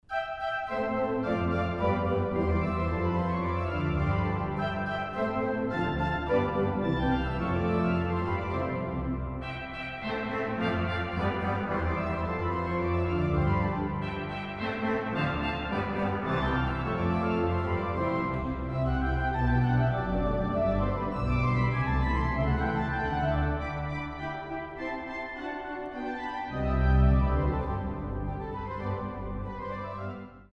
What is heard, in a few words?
Rondo Allegretto, orgel